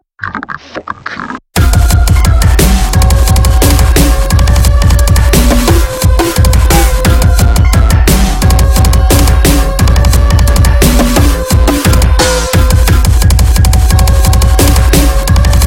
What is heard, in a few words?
flesh demon loop theme; svencoop; source of life